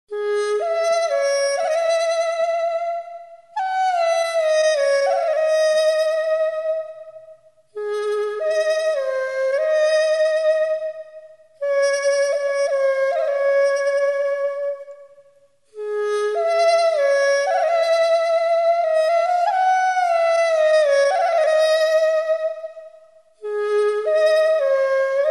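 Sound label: armenian duduk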